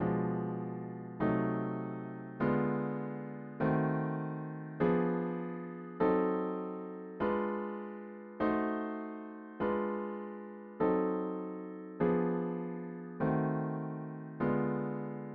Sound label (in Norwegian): forminsket skala